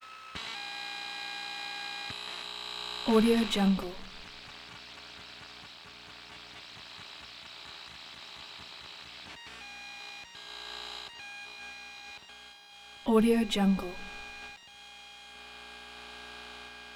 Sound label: beeps squawks static sporadic buzzy bursts; prosoundfx